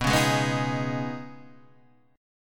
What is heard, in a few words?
Eb 7th Suspended 4th Sharp 5th, B